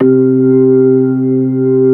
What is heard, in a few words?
C3, Keyboards Old School; USB Soundscan vol.09; AKAI; SMALL B3